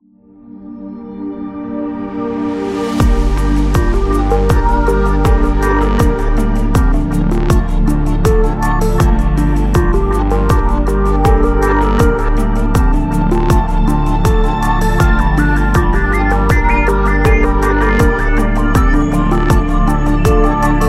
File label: Ambient Sad; agency promo